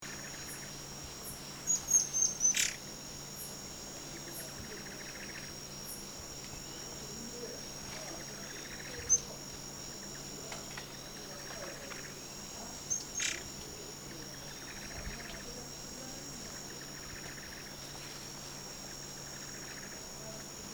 burung murai besi murai cai murai air mancur

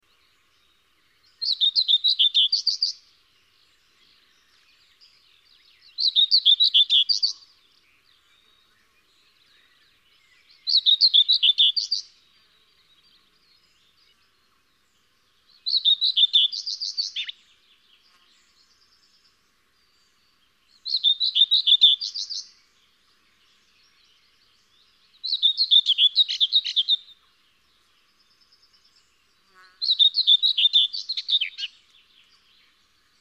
whitethroat varian

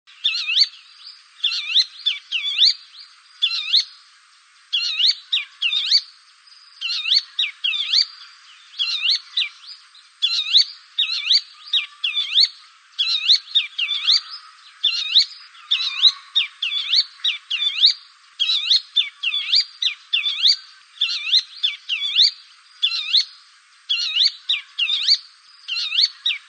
suara bronze sunbird

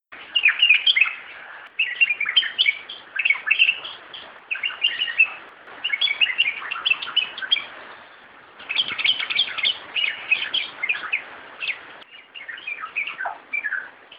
trucukan ropel

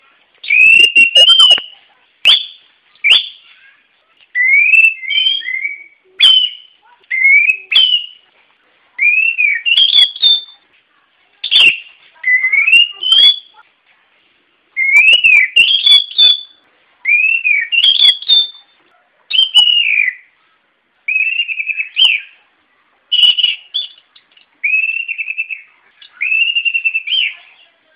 anis cendana

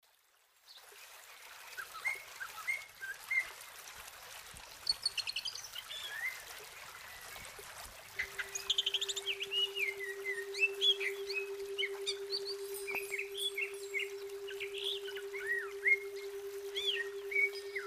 cucak ijo brainwave

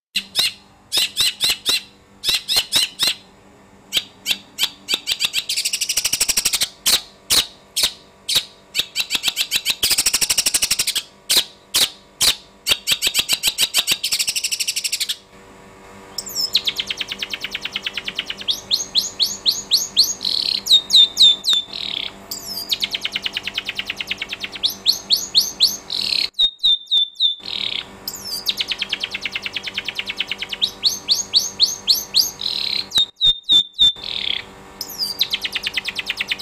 audio cendet listrik

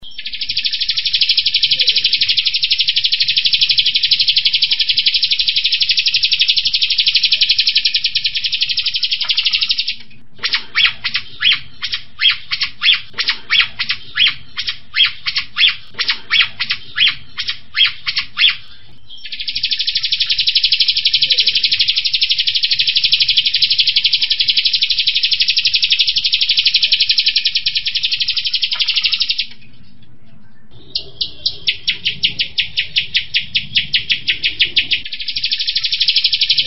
jalak rio masteran

